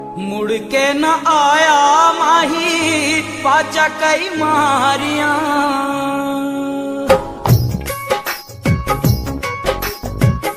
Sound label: Punjabi Sad Song Ringtone